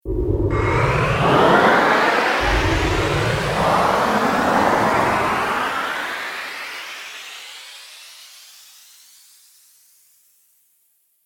Teleport Time Travel, Science Fiction Sound Effects